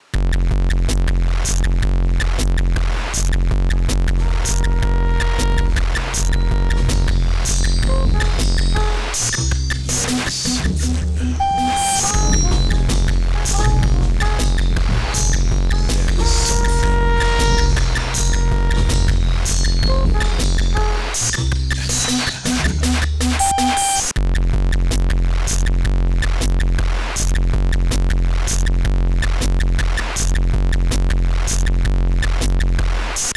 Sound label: beat test